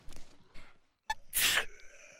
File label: Sneeze